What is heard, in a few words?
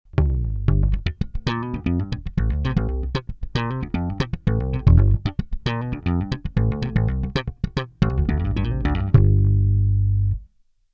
Walrus Audio Badwater Slap EQ Compressor 60